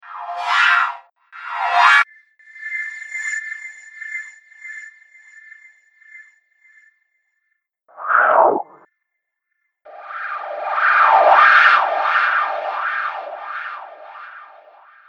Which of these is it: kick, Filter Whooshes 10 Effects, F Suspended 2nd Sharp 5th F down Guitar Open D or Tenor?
Filter Whooshes 10 Effects